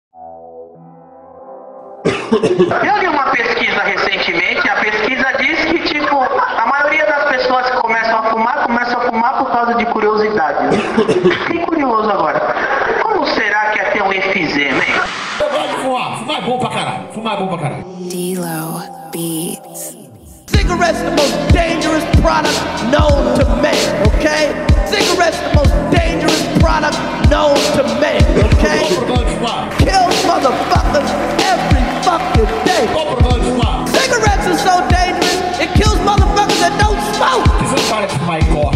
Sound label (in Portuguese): tabagismo interludio low beats